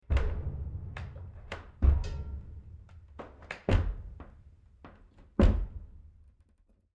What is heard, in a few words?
Footsteps Walk Wood Floor Perspective Basement Pipes Movement; Walk Below Wood Floor; Foley; Footstep Sound Effects